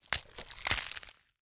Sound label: gather meat